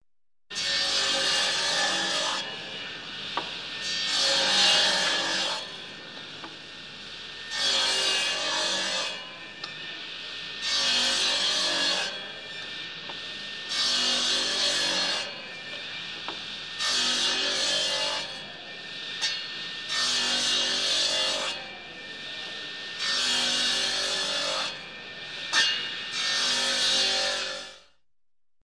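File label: table saw sawing off nine pieces of wood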